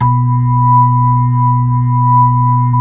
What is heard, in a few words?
B-3, Hollow; Keyboards of The 60's and 70's